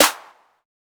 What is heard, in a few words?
clap; foxdot default